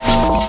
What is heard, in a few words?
Jangly Detuner; Convolution; Special Effects; IR Samples; Kontakt 5